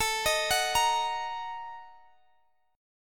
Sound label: A sharp Major 7th Suspended 4th Sharp 5th